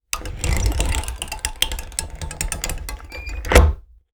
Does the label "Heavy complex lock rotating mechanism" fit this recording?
Yes